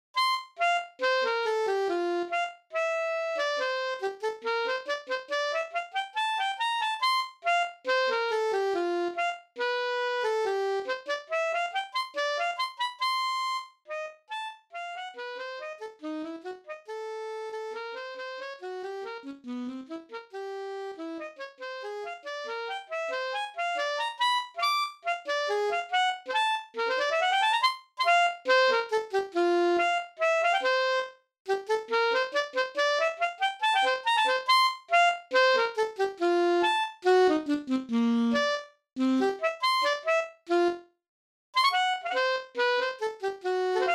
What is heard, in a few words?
Gavotta